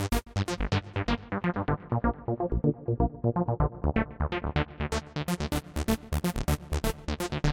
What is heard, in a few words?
Very Melodic Lead; djmartin